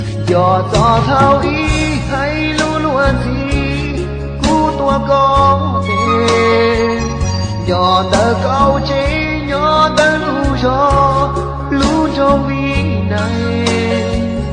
Hmong

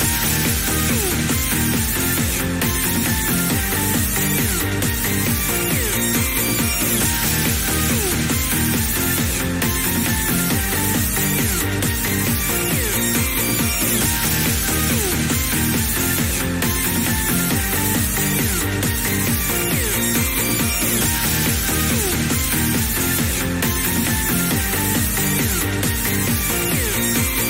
Loop

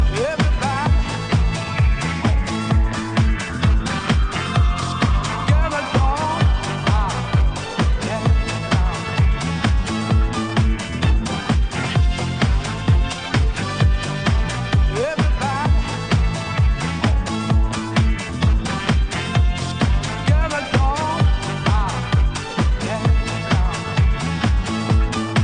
House Music

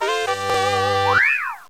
Get Badge

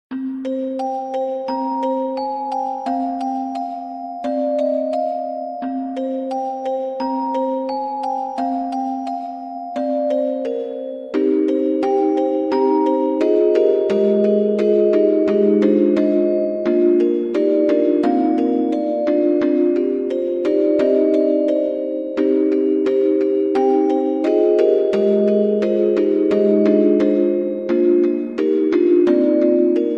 Music Box; Mario

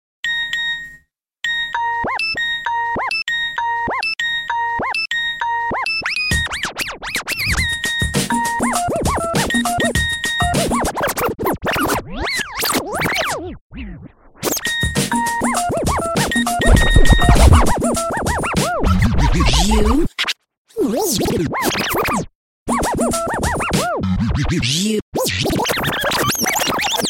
Nokia Scratch

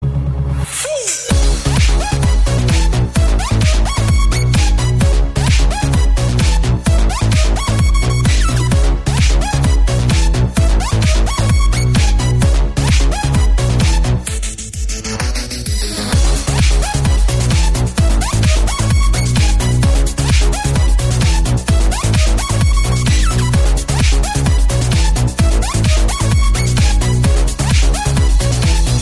Electro

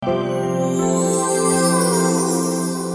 Cool chimes Sms

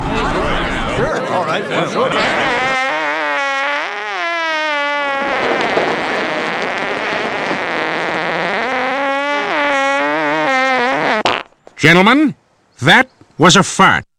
Fart

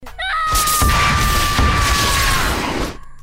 Ahhhhh

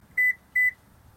Toyota Keyless Lock

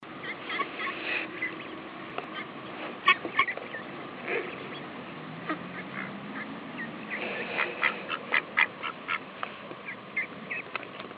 Duck

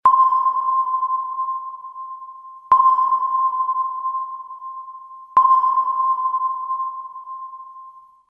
Real Sonar

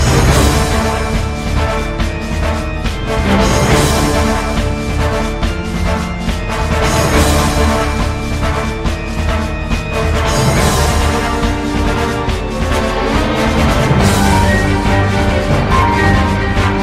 Tension Rising

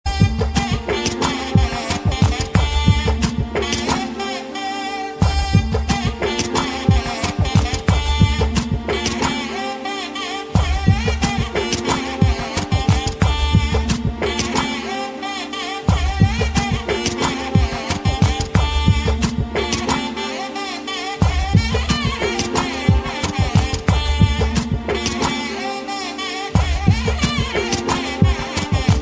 Fusion Nagaswara

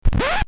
recordscratch

